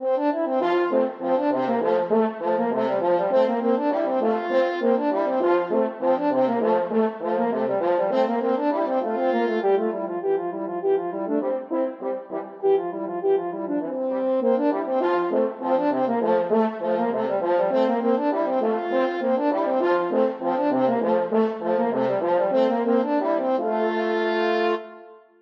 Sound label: French horn duet